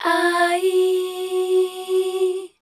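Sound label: MEL F sharp; Best Service; Voice Spectral II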